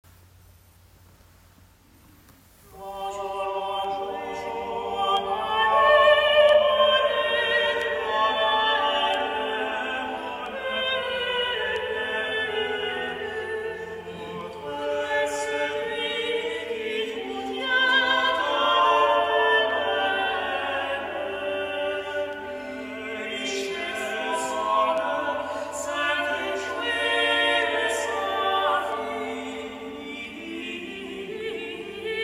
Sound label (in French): chant; bon jour